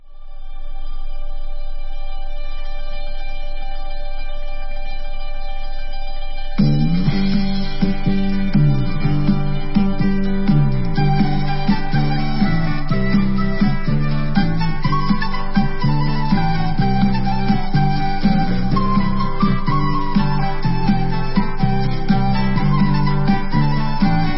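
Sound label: debka instrumental, Israeli Dances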